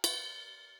ride, Millenium MPS-400

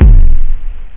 distkick; labrat; jazz jackrabbit 2

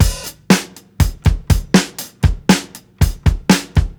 SUPRA 120 FUNKY, drums; Basement drums vol 2

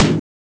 x drum; ST-XX Amiga Soundtracker Sample Packs

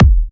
Electro Kicks; Vengeance - Essential Clubsounds Vol.4; Bassdrums

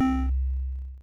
synth, amiga med

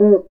CUICA; ILIO

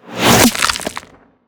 Drums Snare; neuro; BIG5AUDIO

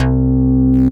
SYNTH UMB; AMG - Global Trance Mission VOL-2

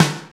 DANCE SET, DRUMS

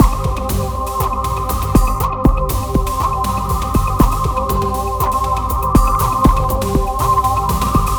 Darkwave, Processed Percussion

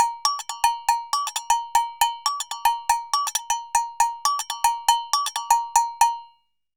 Agogo Samba 120 bpm; Best Service; Festa Latina VOL-1

